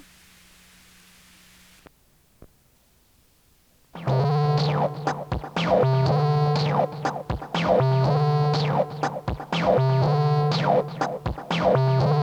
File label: wack beats